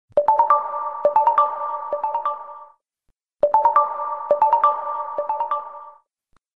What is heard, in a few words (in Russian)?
standartnaya melodiya sms na sony xperia z; prikolnyy signal